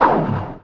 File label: press; Superbike 2001